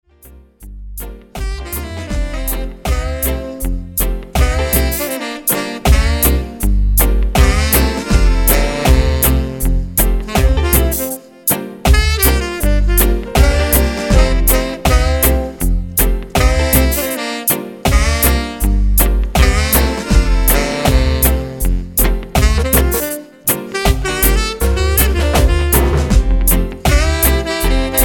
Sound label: horns